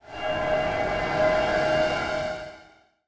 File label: magical sheen; soundscrate